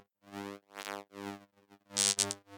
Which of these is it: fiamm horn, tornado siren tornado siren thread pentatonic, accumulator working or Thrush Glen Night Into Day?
accumulator working